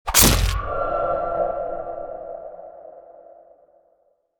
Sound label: crit; cappuchino assassino